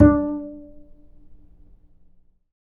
D 5, Bass; Spectrasonics; Bass Legends

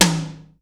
LAUNCH TOM X, drum; Crypton - DrumBase5000

